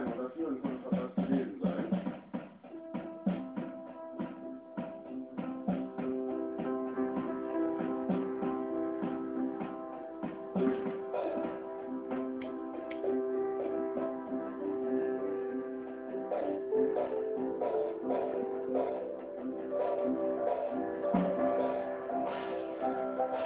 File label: piano drums